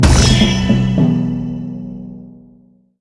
ui battle clear

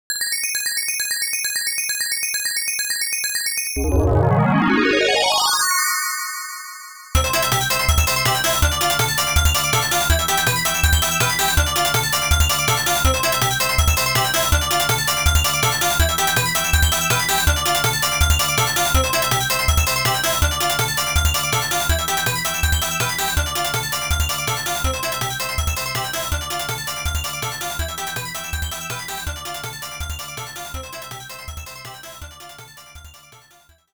Extend Bonus Music

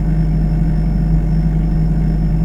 Fridge Loop

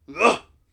Grunt; Hollow Knight; Nailmaster Grunt

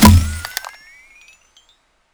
Shoot

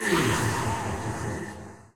Magician Attack

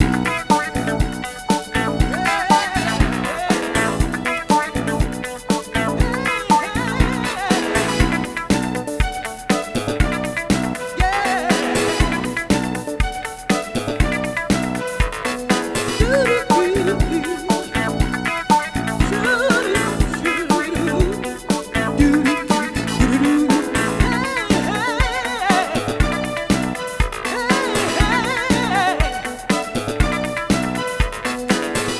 Amb Disco

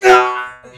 Male Hurt Robot; Mars Sortie

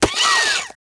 Enemy Firebird damaged

